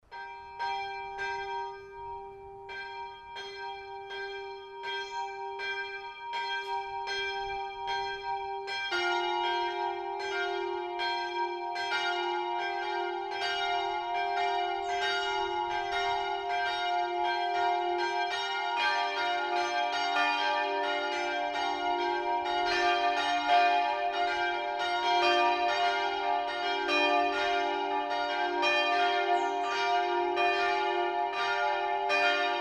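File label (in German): Tonaufnahme d2 f2 g2, mehrstimmige Geläute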